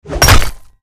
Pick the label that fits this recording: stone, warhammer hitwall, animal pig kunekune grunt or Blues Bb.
warhammer hitwall